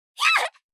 "ひゃあ！"; 相づち・反応系【ネガティブ】

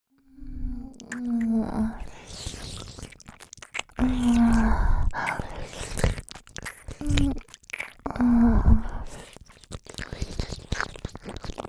両耳舐め

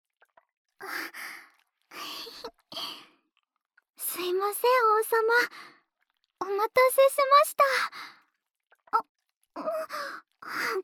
とろとろスライム二穴（耳）責め ASMR

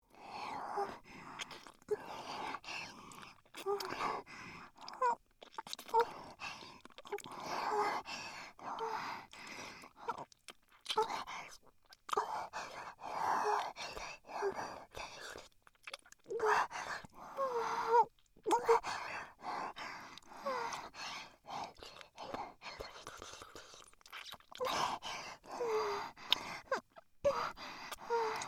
ディープキス音; ASMR

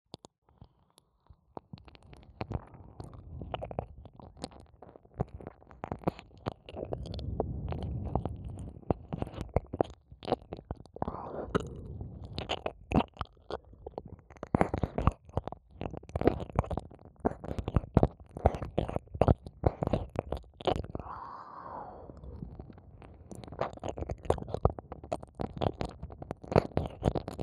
右耳舐めループ; ASMR; 耳塞ぎなし

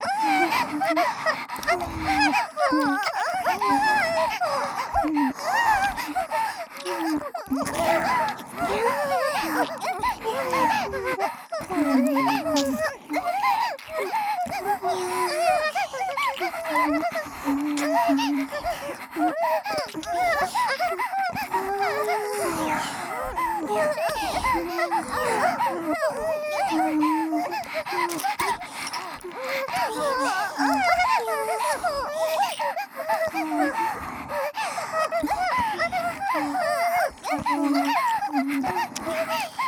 Wにゃんにゃん耳舐め＋W喘ぎ

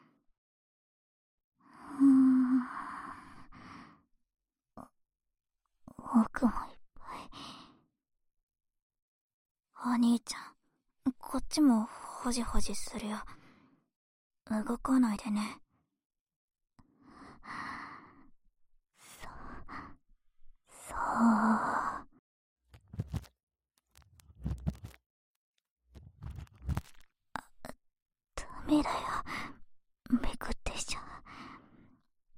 【右】奥耳かき; 耳かき小分けトラック

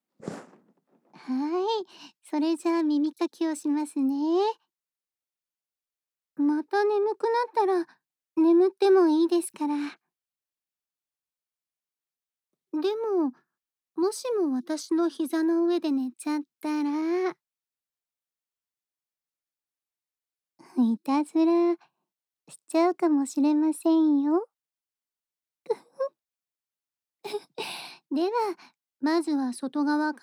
耳かき（左）; ASMR

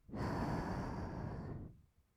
吐く音, ブレス; ASMR; 1200 kawaii voice!!

